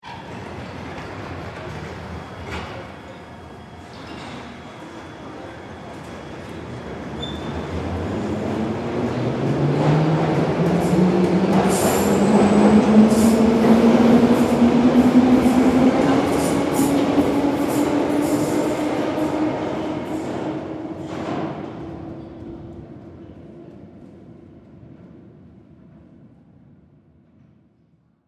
Subway Away London, Transportation, Train, Subway, Away, London; Train Sound Effects; BlastWave